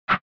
SNES Action Throw

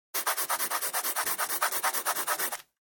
Mechanical Pen Fill

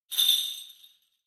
Kagura Suzu

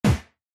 Hit

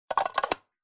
Pencil Sharpener Close

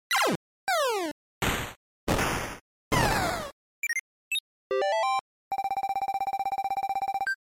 NES Shooter

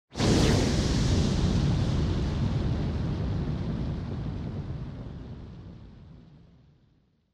Fired Up; Onoma-Fired Up

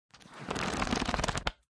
Book Flick Through

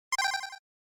SNES Shooter

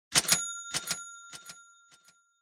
Cash Register Drawer

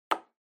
Felt Tip Pen Put Down

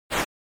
SNES RPG Attack Enemy

